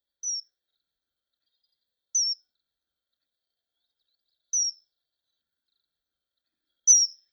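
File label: common kingfisher call, birds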